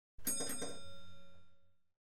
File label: Bus - Interior - bell rung twice; Cities; The BBC Sound Effects Library